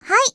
menu hit; osu!; angelmegumin hvick mix

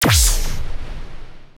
menu hit; osu!; Android 4 Kitkat

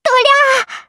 combo burst; osu!; Night-Gre3n

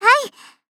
combo burst; osu!; Retronix 2.0 HD optimized

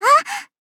combo break; osu!; hvick-coogtb

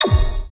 select expand; osu!; Android 4 Kitkat

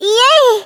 combo burst; osu!; Night-Gre3n

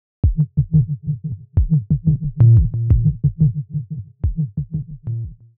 fail sound; osu!; Luminance - Fluorescence

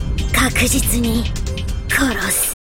section fail; osu!; Night-Gre3n

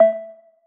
menu back; osu!; Luminance - Fluorescence

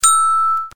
spinnerbonus; osu!; Android 4 Kitkat

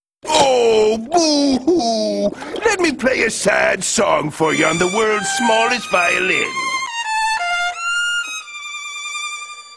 fail sound; osu!; Night-Gre3n baka nocomboburst

